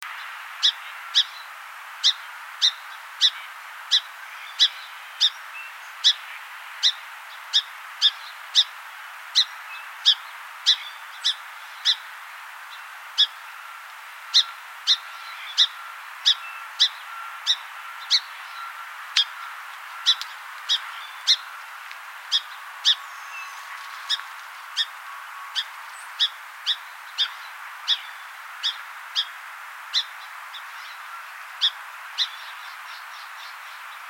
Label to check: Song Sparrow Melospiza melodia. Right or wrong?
Right